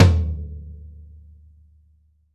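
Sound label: floor tom; drum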